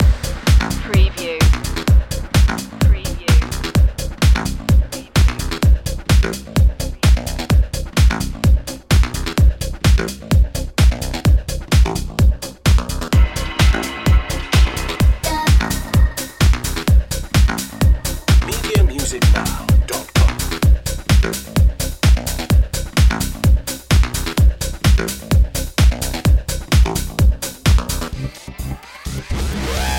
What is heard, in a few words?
groove station loop